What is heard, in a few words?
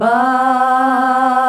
"BAH" C, FEMALES; Best Service; Hyper Dance